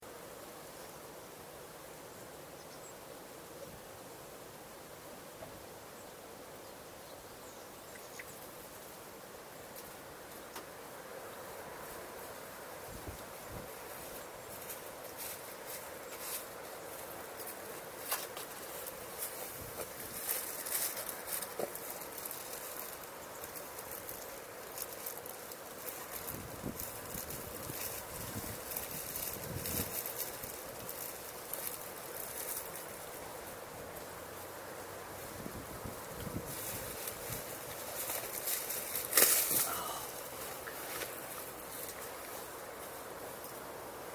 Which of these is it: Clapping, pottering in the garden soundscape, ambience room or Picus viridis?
pottering in the garden soundscape